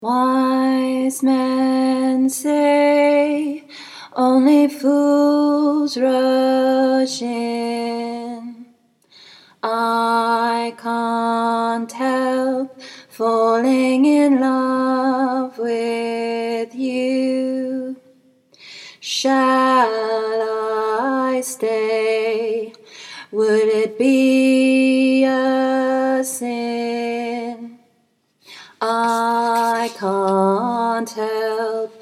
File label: tenor